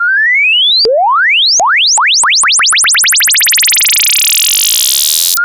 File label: BOUNCING UP, BOUNCE SWEEPS; Zero-G Ambient 1